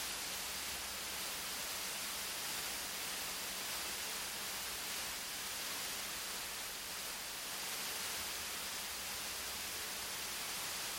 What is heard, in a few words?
Min Fan Speed